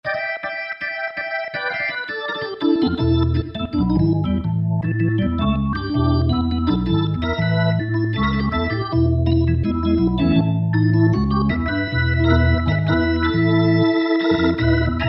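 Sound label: Rotary, Organs; Yamaha A4000; Piano - Keyboards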